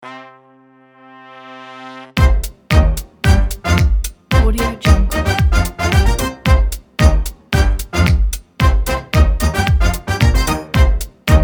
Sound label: big band jazz